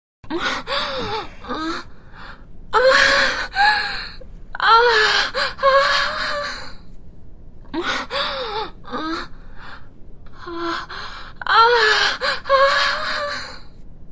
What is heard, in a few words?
orgasm